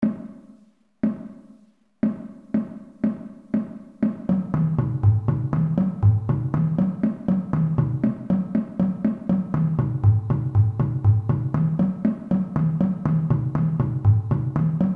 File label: bass 8's split, drum music; fast; marching; Warm-Ups